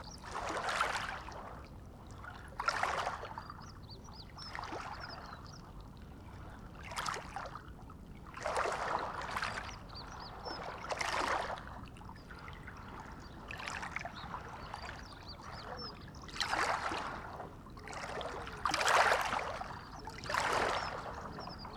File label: waves; ambience; netfishing